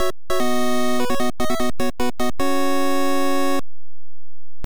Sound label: Game Start; Road Fighter; NES